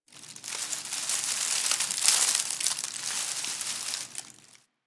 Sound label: Tree Crackle; Ambience; Interior; Warframe; Orokin Derelict